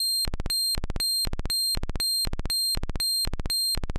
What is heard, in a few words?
LFO Square